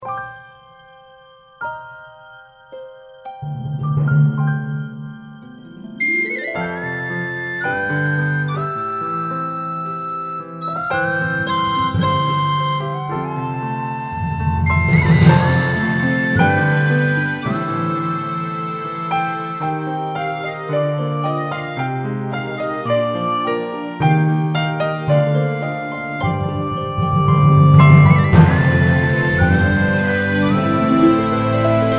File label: Irish Whistle quiet intro